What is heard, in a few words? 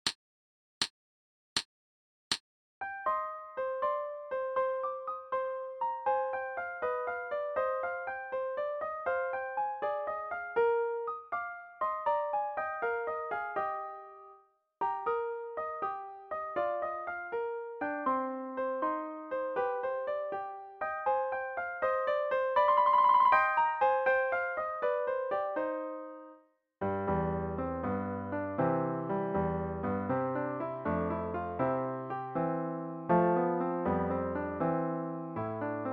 jig, full tempo no solo no click